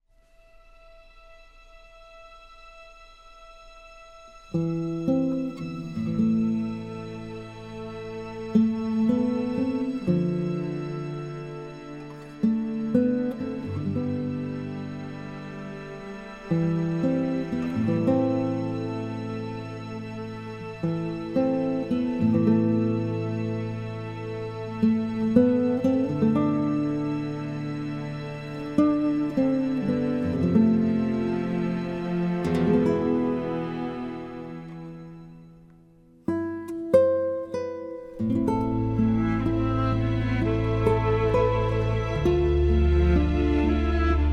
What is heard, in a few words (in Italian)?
Chitarra e Archi; La grande guerra